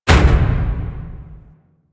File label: Menu Screen Transition; Warframe; Caliber Chicks minigame